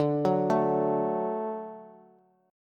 D Major D down Bass; D arpeggiated; JGuitar

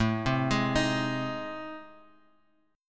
A Minor Major 7th Flat 5th, Guitar Open F; AmM7b5; arpeggiated

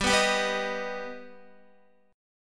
G Augmented G Guitar; Listen to G+ strummed

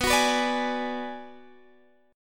B-Minor 6th B down Guitar Standard; Bm6 strummed; JGuitar

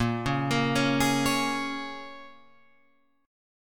Bb Minor 7th, Guitar, Open F; Bbm7 arpeggiated

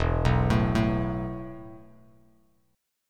E Suspended 4th E, down, Bass Standard

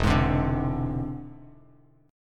E Augmented 7th E down Bass Standard; E+7 strummed; JGuitar

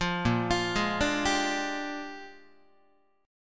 A sharp 13th, Guitar chord; arpeggiated; JGuitar

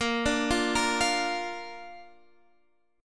Bb Major, Guitar, Standard; 18,17,15,15,18,x; Bb arpeggiated; JGuitar

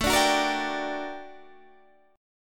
G Minor Major 7th, A, guitar chord

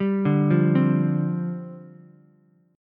G 7th Suspended 4th G down Ukulele; G7sus4 arpeggiated

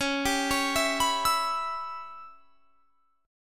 C sharp Minor 7th Flat 5th C sharp; C#m7b5; arpeggiated; 21,22,23,21,24,24

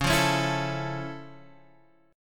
F Augmented Major 7th C sharp, guitar chord

